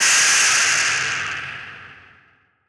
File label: Distorted Scream; SoundsCrate